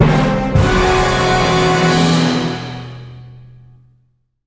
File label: dun dun dun; SoundsCrate